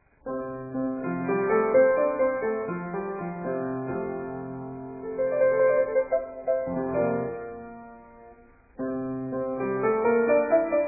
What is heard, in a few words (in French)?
promenade polka; o metra